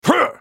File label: effort; JarvanIV